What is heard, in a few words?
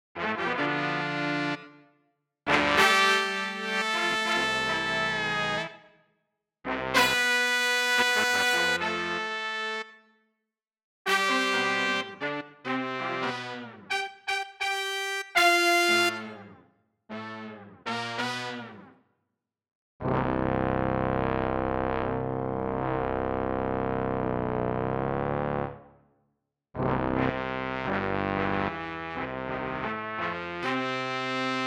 Hot Brass